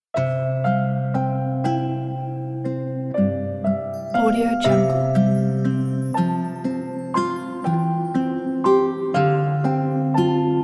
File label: lullaby